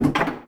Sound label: biomech mechanism small